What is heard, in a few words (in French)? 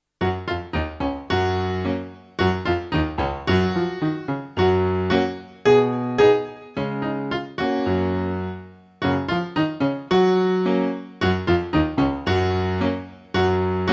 papa instr